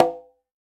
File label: CONGA OPEN; World Combo